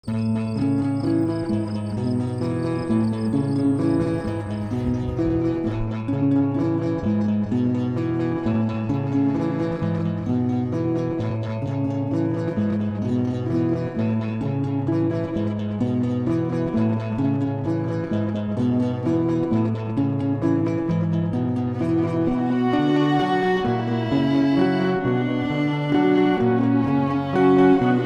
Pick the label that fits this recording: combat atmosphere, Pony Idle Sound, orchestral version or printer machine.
orchestral version